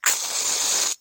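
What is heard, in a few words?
match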